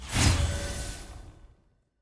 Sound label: sfx mastery